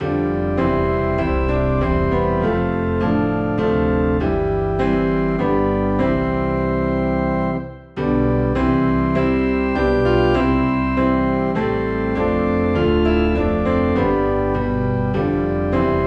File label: Piano and Organ Low in C; The Master has Come